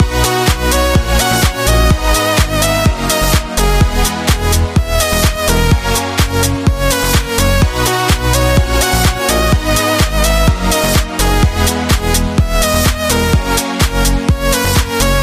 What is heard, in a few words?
Funk